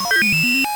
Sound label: Beep Sequence